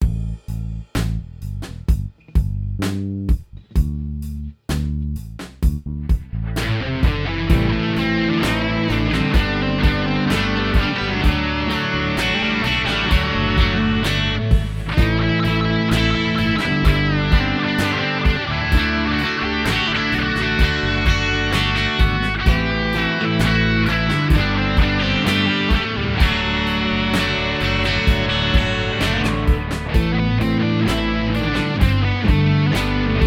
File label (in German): Dorisch Mit Akkorden